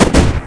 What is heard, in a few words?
flare